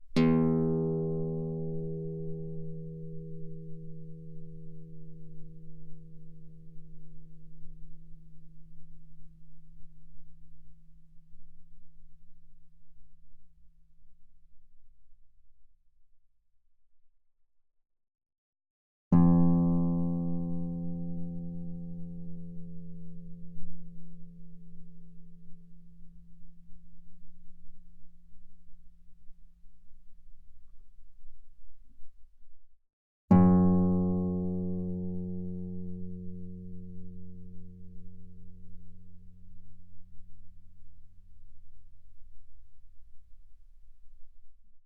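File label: Guitar fortissimo sul E E2 B2; mono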